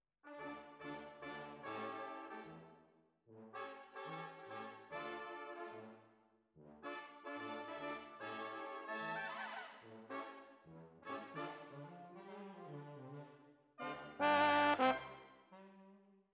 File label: Horns